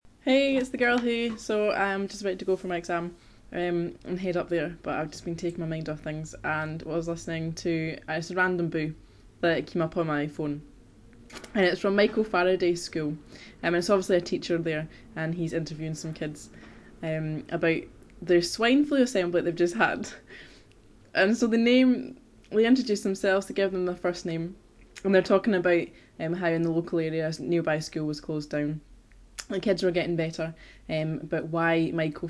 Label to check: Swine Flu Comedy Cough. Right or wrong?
Right